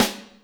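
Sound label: Snare Wet, Alesis D4 drum machine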